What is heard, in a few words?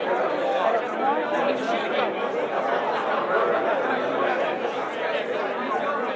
Ambi, indoor; Atennis